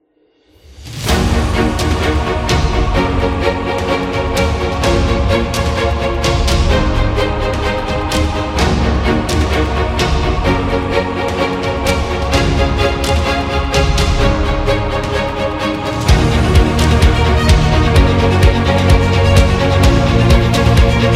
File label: Epic Corporate